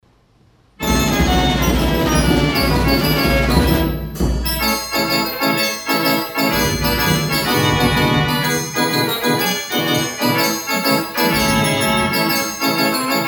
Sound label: Liberty Bell, band organ